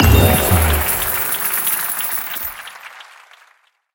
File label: success; spin wheel